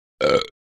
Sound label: Belch Close Perspective; Human Sounds